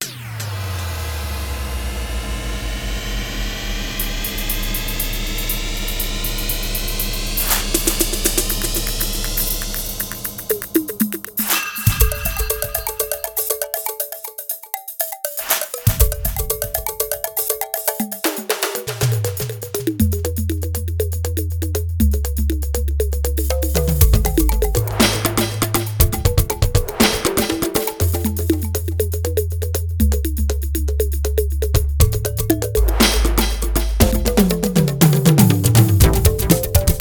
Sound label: Korg S3